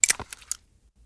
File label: Bottle Replace Cap